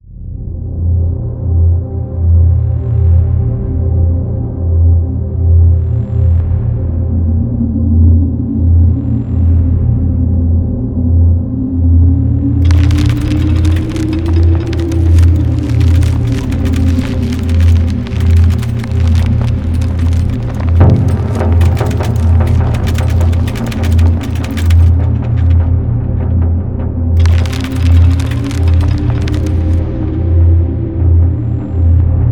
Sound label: Ambient